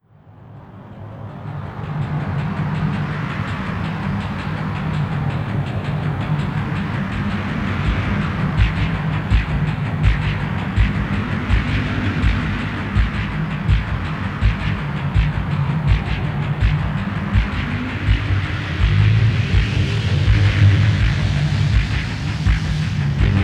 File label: Industrial Muzak Live